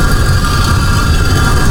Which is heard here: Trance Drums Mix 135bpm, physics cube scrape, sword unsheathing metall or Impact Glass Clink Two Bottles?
physics cube scrape